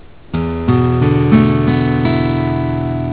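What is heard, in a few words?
E7sus chord, guitar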